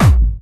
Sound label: Harder, kicks